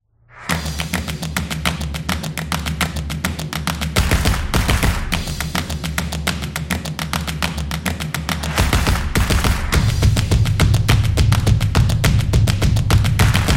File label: Drums Claps; percussive nation vol 5